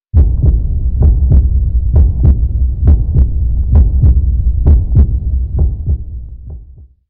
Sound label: Heart Sms, Sms Tones; Ringtones